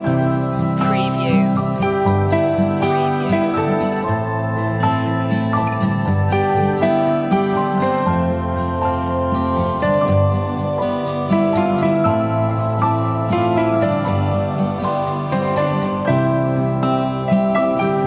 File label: piano dream